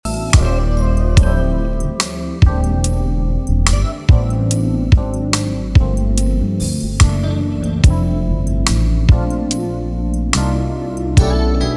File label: instrumental